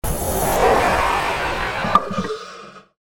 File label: hydraulic door